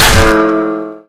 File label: melee guitar; weapons; source engine; left4dead2